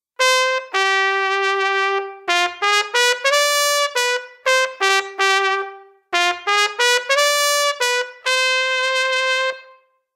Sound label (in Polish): trabka; Nagrania Lokomotywy; edukacja wczesnoszkolna